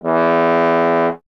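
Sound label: bass trombone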